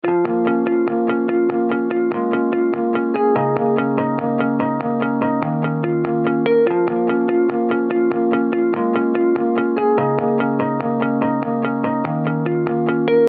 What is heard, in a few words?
145 Bpm